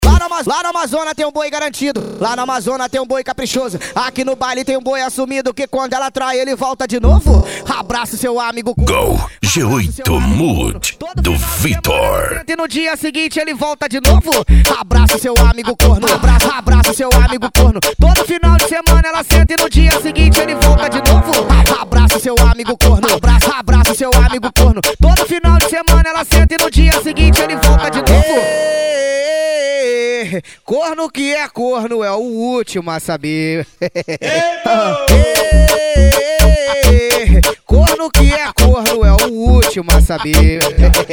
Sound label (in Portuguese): funk ritmado